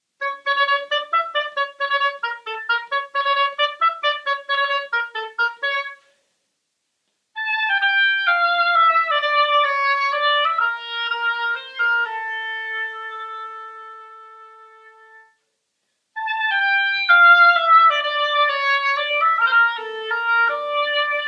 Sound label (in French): Hautbois; instruments musique